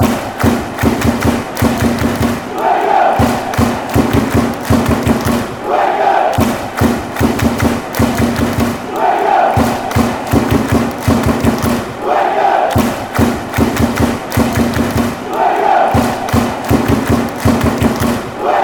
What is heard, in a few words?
drums, fan chants; wigan